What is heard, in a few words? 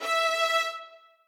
string